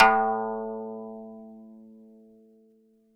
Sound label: kanun A2; SoundBytes; Early Patches; KAN SING BIG